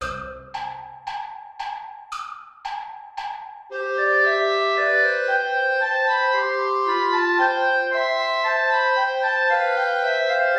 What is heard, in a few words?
air play along without contrabass; bach johann sebastian